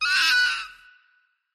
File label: fox screaming